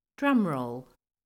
Drum Roll

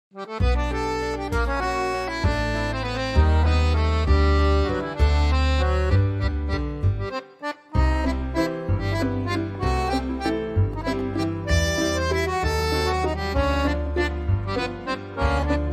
Pirouettes Waltz; A Birthday Waltz No.3

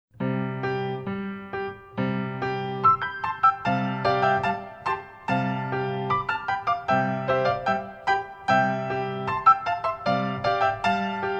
Pas de bourrées

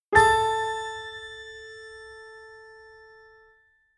French Ting

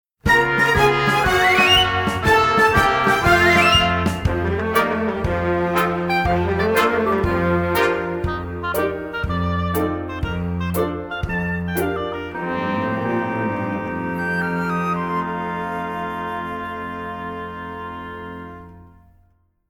Playoff

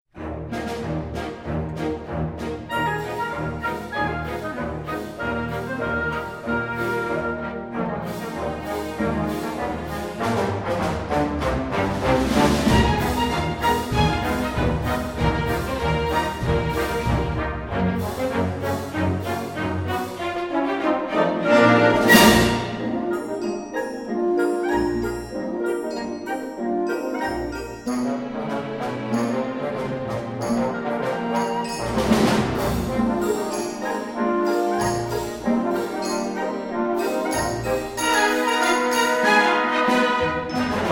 Turns Continuous Cakewalk; Golliwogs

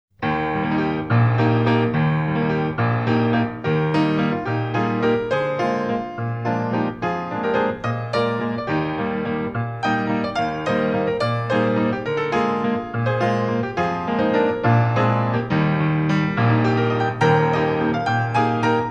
Grands battements and battements en cloche

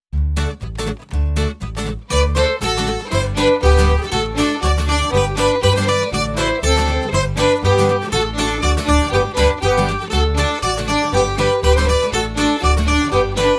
Springs Solo

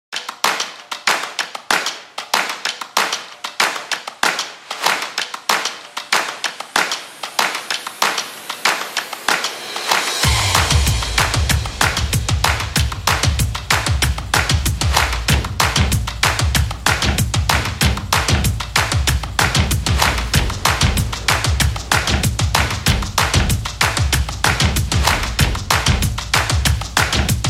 Double Wings Music

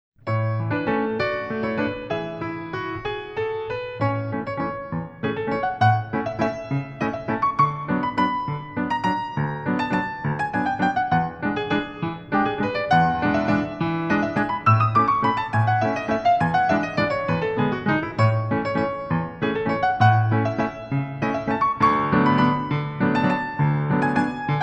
Grand Allegro

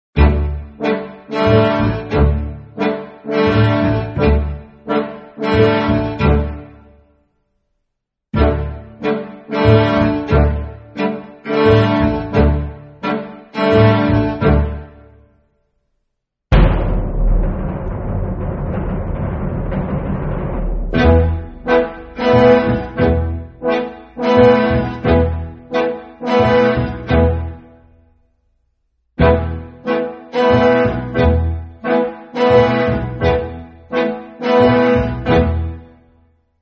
Underscore INST

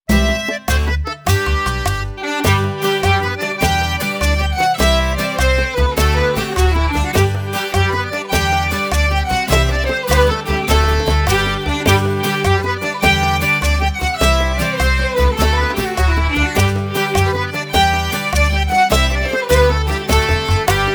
Skips Continuous

